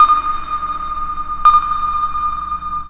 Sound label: Junglist Sonar; Fx; Zero-G Sound Sense Grime Scene; 90 s Sample CDs